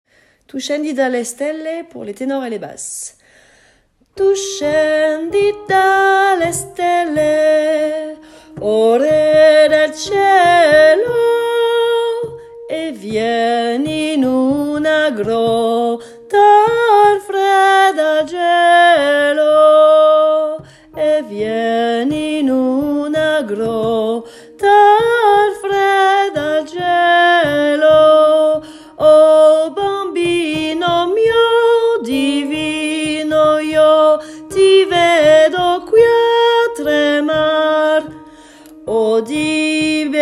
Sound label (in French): basse; Tu scendi; pupitre basses